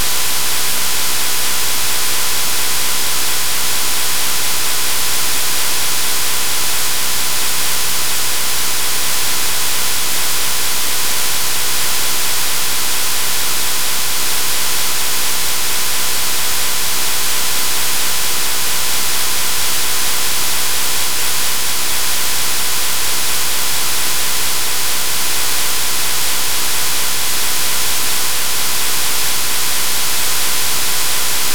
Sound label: IDEN Utah Full